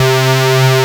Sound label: DIGI WAVE, DIGI PAD; AKAI S6000 CD-ROM - Volume 1; SAMPLE WAVE MIXING 2